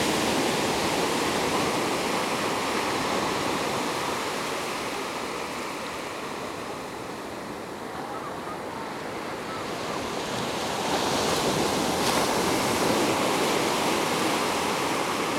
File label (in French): son vagues